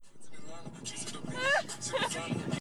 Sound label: car laugh